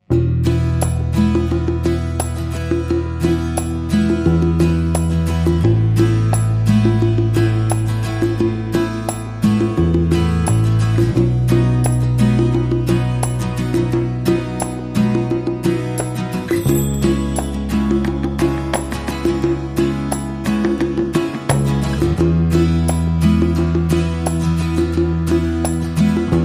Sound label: Easy Guitar